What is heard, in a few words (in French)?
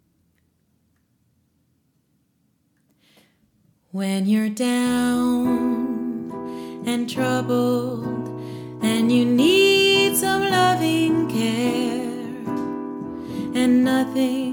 Chant piano